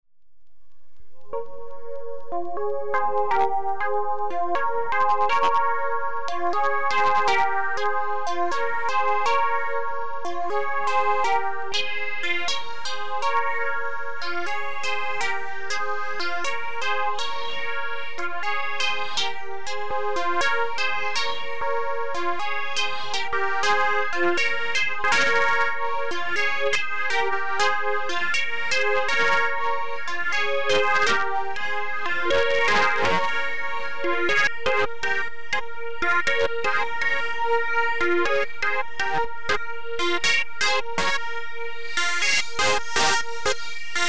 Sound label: Chords